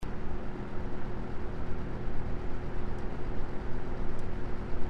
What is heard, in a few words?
Foam Low; K-Tek